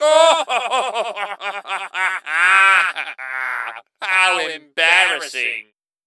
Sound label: wave lost; Gray Mann; MvM